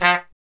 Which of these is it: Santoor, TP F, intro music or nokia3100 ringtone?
TP F